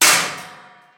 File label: Impact